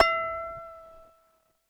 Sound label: ASI KOTO E4, Yamaha VP-1, ASIA-KOTO; Music Store; 90's Sample CDs